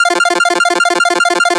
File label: 8Bit 150BPM 1 Bar Arp F; Antidote Advent